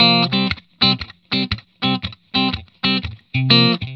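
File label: Vintage Blues Guitar, rhythm 120; USB Soundscan vol.22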